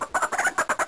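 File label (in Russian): короткое кудахтанье драчливой курицы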